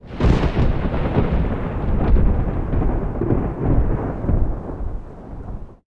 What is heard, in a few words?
Thunder